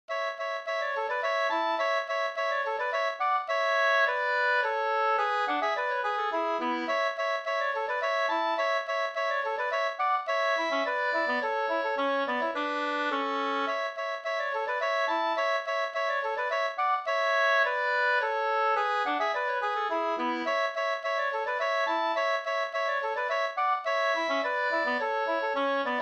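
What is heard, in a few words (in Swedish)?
slangpolska, two-part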